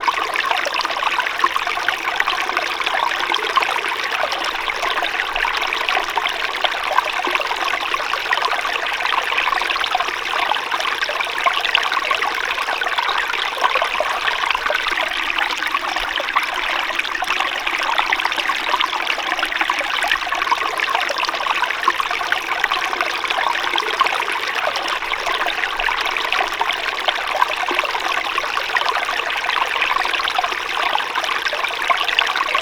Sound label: Water stream